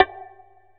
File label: HI BONGO; Real Oldies2